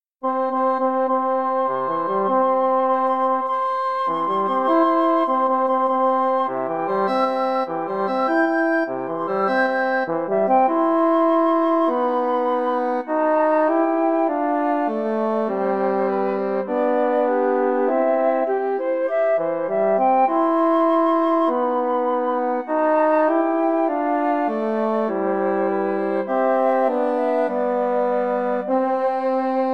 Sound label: flute clarinet horn